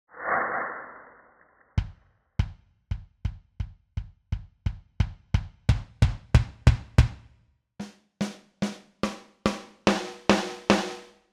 Kurzweil PC4 Drums

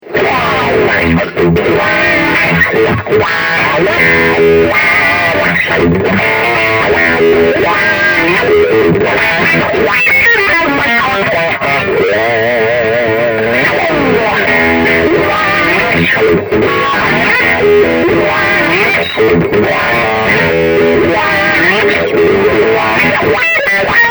wah

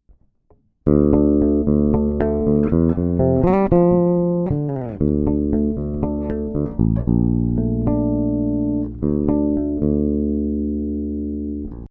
Bridge PU paralell; Marleaux

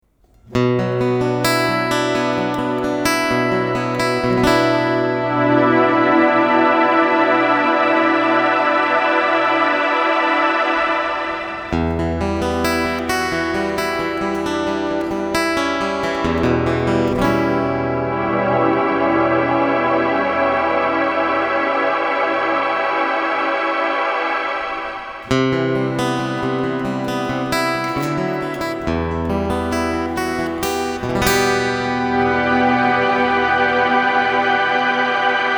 SH1 hold

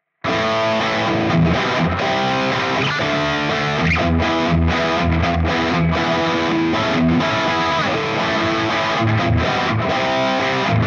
Epiphone Les Paul Bridge Highgain Rhythm

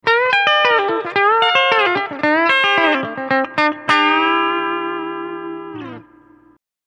country twang

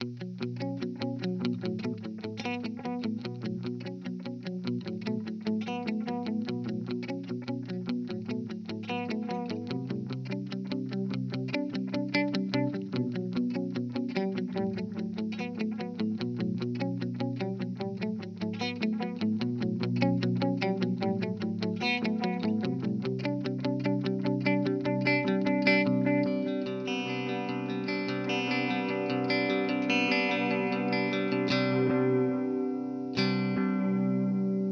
Teisco Delay

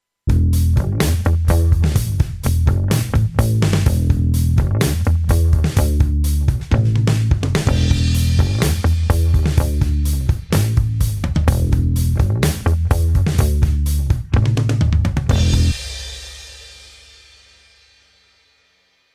Drums Bass; THD Medium

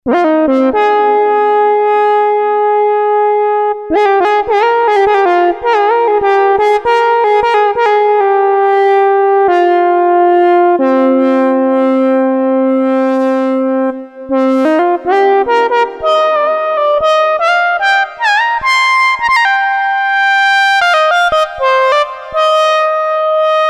Triangle Lead

